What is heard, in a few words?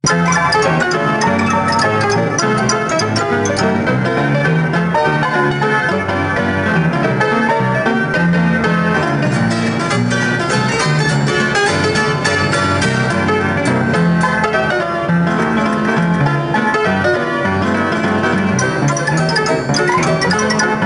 Penny Arcade Music Box